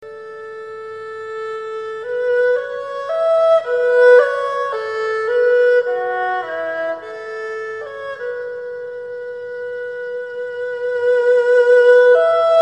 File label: Heart Strings